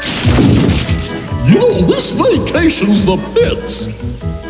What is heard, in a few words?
rhino